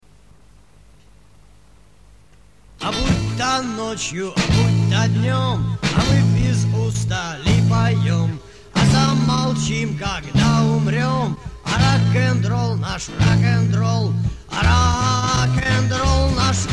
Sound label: Rock&Roll